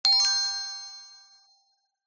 magic wand ping; zapsplat

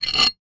industrial axe pick up; zapsplat

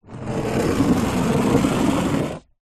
foley stone scrape wooden surface; zapsplat

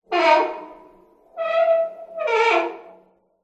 horror door heavy metal dungeon creak squeak

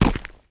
footstep dry forest floor; foley

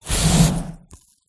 Fire Spell Pyro Burst; sound spark

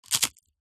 human bite single eat crispy dry food

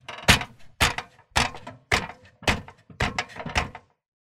foley footsteps up step ladder metal; zapsplat

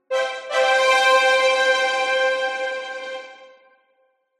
MUSICAL ORCHESTRA Jingle Brass And Strings Solemn; smartsound

